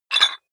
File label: dumbbell pull up rattle, fitnessstudio